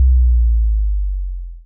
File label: bass